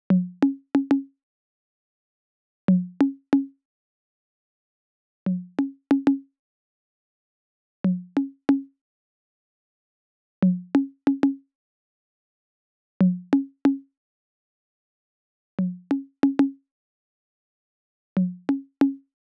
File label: drum tracks perc, Smooth RnB 093 Cmin